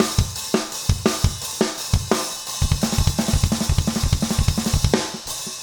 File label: rock 85